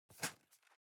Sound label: object box cardboard close fold single